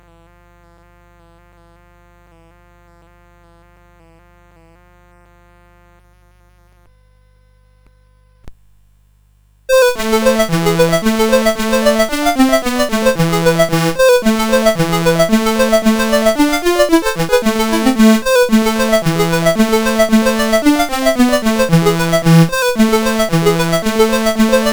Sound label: Etude, CSG8580R5